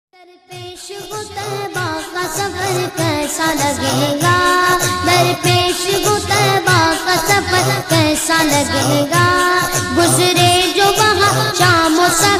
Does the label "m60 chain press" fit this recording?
No